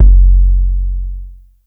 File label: 808 BOOM; TR-808; Hollywood Edge; The Big Bad Beatmachine